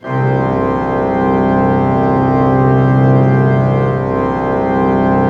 Orgue Eglise Full C2, Pipe Organ Full

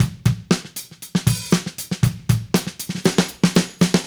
Drums Fill, 70s Analog Funk; Sonic Foundry (Sony Creative Software); What it Is! 70s Analog Funk

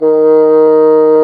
BASSOO, Bassoon; Brass&Woods

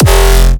Powerstomp Kick F

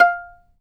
fiddle pizz F5 L; Sampleheads; Whole Lotta Country 1 & 2

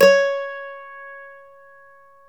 MANDOLIN

